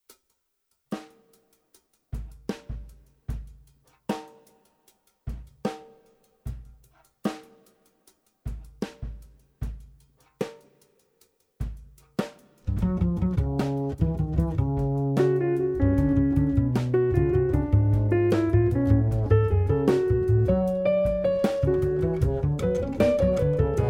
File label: Nocturne in F sharp minor